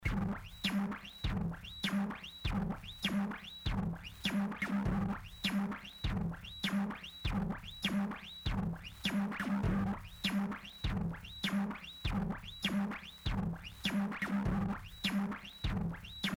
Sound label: T2 60s drums UFO